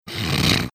snorting

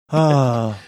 sighing

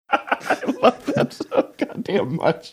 laughing saying i love that so goddamn much

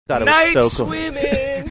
singing

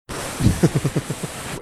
blowing into microphone mimic wind noise

